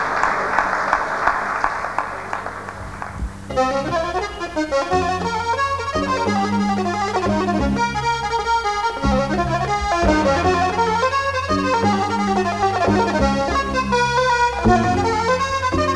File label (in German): Handorgel; Hilton 1984 Original tape